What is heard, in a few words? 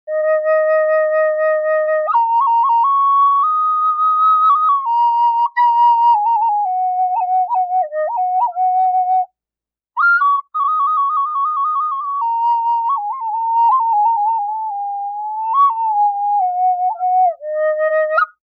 Small Eb, Flutes High; Purple Heart